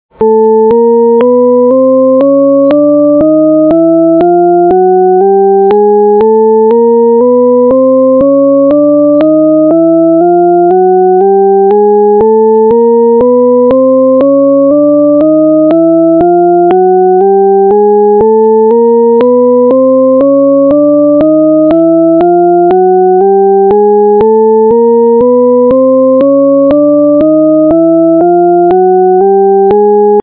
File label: shepard